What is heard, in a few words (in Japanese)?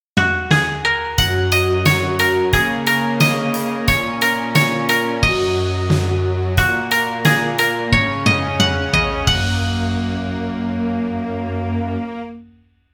和ジングル